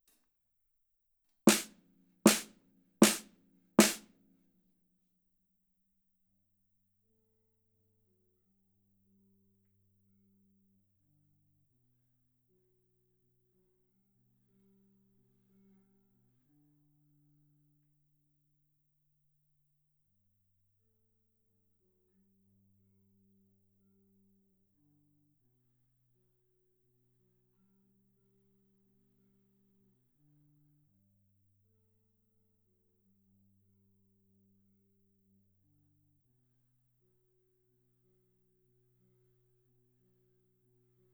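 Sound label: PGA98 Snare Drums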